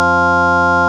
CLICKY F2, clicky organ

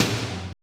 SEATOM LO; POP-DNC 2

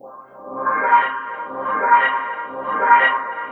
ORCHFX, orchestra, Asian Traditions

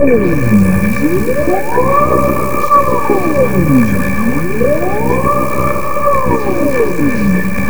bad dream, vox FX; Big Beat Samples & More; AKAI; VOX FX1 KIT

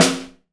SNARE, Drum Mashines; EdgeSounds; Drum Mashines VOL-1; SNARES 1

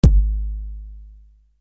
LONG KICK, Yamaha RY-30

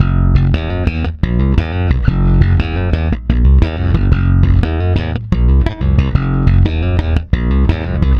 PWR G, Bass, PWRSTRUM; Spectrasonics; Bass Legends; 128 PWRSTRUM

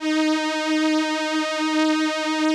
VP-330, String Machines

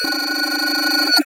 light phaze

